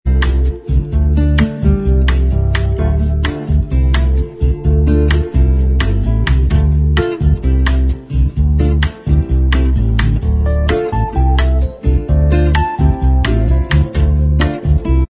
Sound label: bossa mix Inst